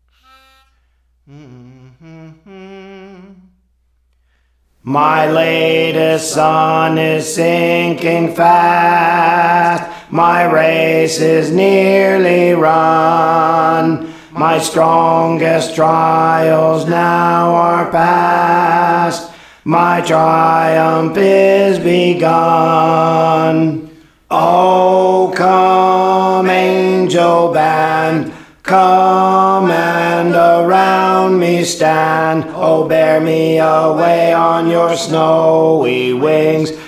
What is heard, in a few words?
Alto; Praise For The Lord Training; My Latest Sun Is Sinking Fast